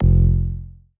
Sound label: Bass F, Bass Synth; 99Sounds; Monosounds; Music Loops; Instrument Oneshots